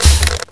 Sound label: crossbow draw; sci arms